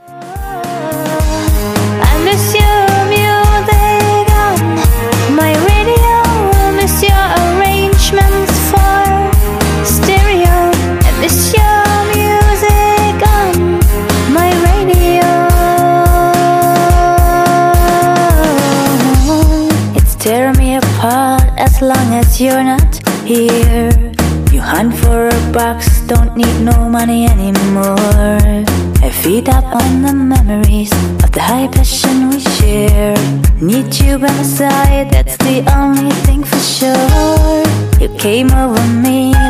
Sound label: Acoustic Version